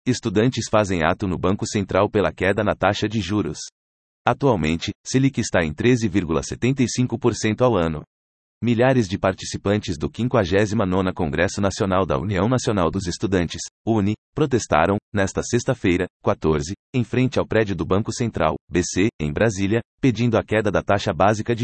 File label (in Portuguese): protesto juros altos; Estudantes fazem ato no Banco Central pela queda na taxa de juros